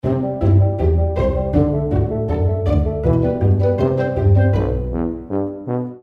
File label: ringtone